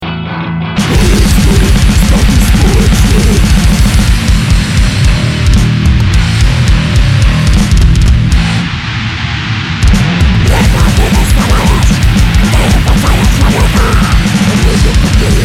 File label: swiss alphorn